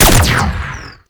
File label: Fire Shot; Duviri; Incarnon Cestra; Weapons